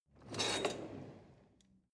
drawer cutlery draw in

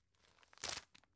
tissue open unopened

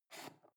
foot switch dimmer slide down

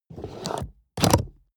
plastic draw lock

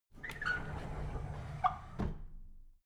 drawer big squeak close soft

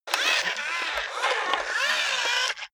robot movement slow long

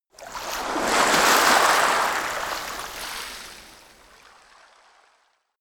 coast oneshot surf soft

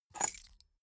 key ring small grab table

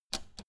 old button simple press fast

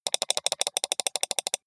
computer mouse click sequence steady

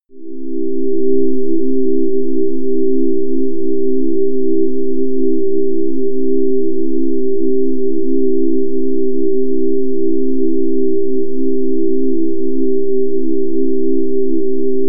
air bell

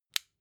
flashlight push in

